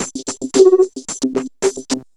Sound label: Vocobeat; Sonic Foundry (Sony Creative Software)